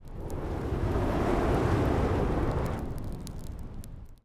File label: ambience room forge working layer